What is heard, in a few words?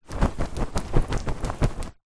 foley shorts combat shake metal fastener noise; zapsplat